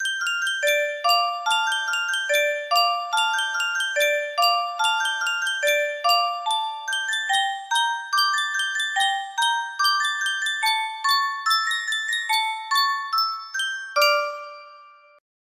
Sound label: Yunsheng music box, music box melody